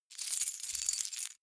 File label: key ring large rattle hard; objekte; schluessel